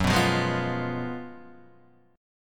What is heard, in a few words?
F sharp Major 7th Suspended 2nd Suspended 4th, Guitar, Open D